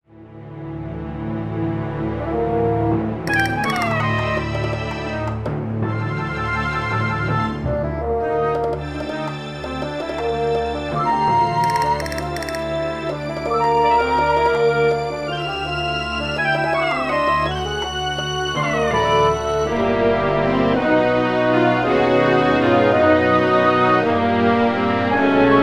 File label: MIDI Playback